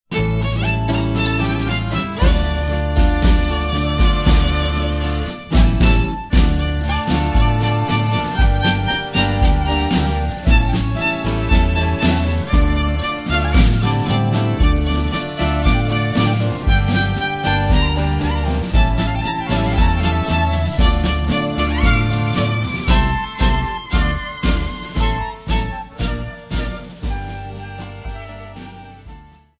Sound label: Instrumental; Old Testament